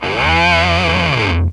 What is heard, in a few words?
LOW FUZZ, Funk Gtr & Wah; E-MU Producer Series Vol. 8 – Platinum Phatt